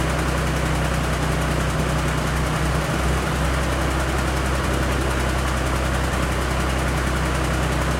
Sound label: load exterior engine loop, engines tanks; War Thunder